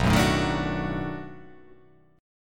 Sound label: B 7th Sharp 9th, down